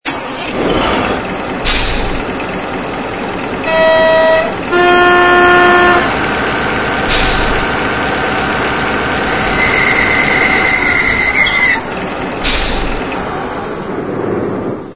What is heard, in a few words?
Diesel Mehano G 2000